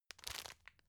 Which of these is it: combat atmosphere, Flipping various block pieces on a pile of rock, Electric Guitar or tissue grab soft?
tissue grab soft